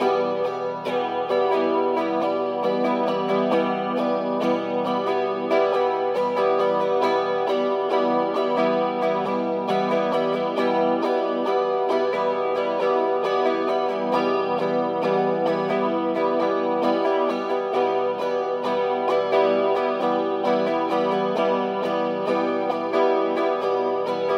bicie e moll, gitara

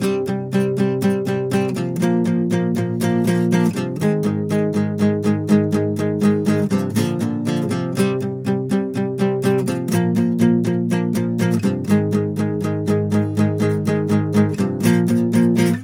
refren gitara

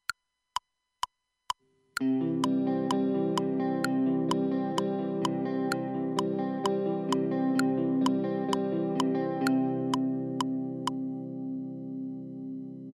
wstęp akord C

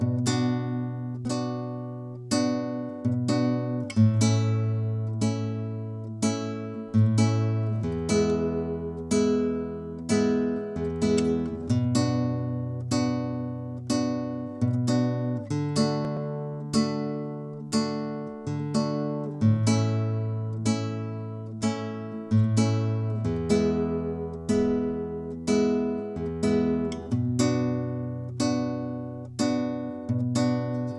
rytm wstep

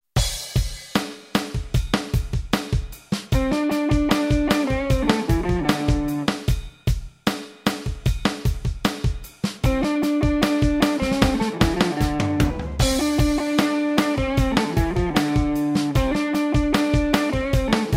gitara akomp

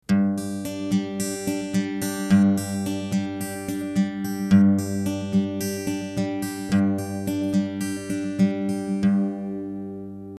pasaż 4/4 G-dur

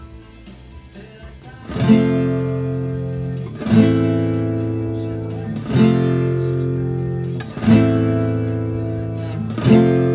arpeggio refren

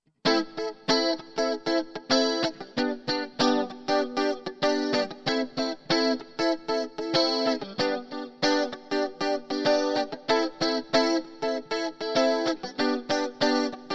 rytm samby staccato

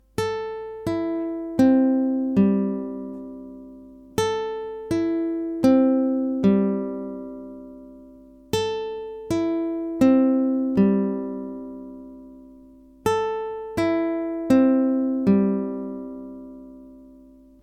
chwytanie poprzeczki 4 struny, gitara

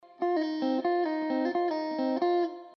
zagrywka na akordzie F, gitara

